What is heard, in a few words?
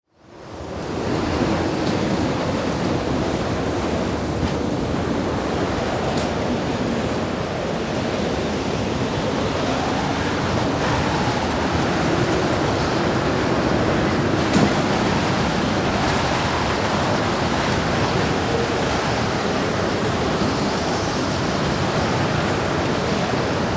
Wind